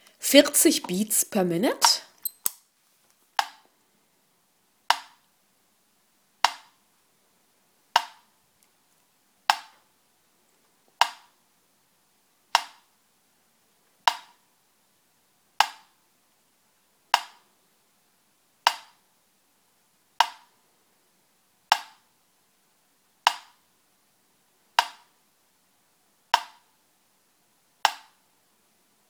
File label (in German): Metronom 40 BpM